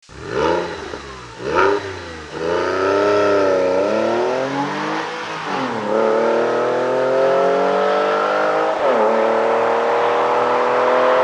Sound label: 911 Sport drive off